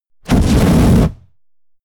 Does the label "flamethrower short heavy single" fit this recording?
Yes